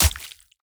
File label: hit; Blind Gladiator